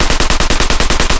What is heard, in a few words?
loop l8a1 origin; guns tanks; War Thunder